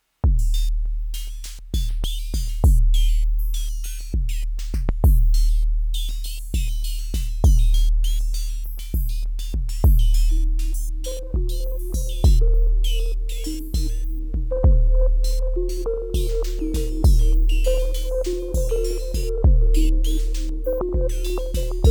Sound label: Quasar Random Session Reverse Delay; Neuzeit Instruments